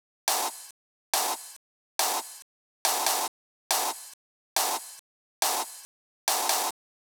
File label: drum Loop 140BPM Clap Add; Vengeance - Essential Clubsounds Vol.5; VEC5 Drumloops; VEC5 Multi Loops 140BPM